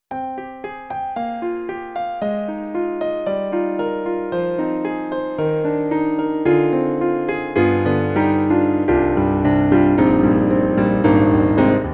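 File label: Dark Grand, piano; Roland; SonicCell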